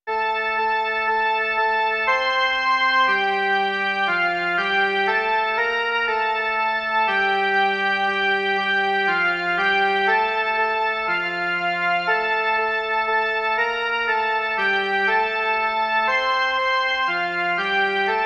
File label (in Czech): jen melodie; Ó náš milý Bože